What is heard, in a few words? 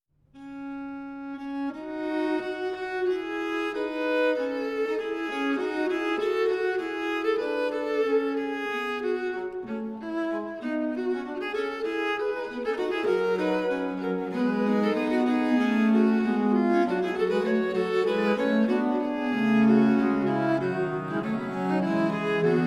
dry; phantasm